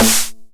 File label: 909 SD Tape Sat; Goldbaby Tape808 & Tape909; The Tape 909